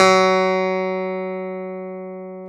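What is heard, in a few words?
clavinet; Roland LCDP10 Keys of the 60s and 70s 2; Clav CA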